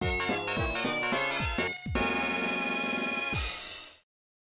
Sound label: Bonus Defeat; Telepachi Fever Lion; arcade gamerip 1996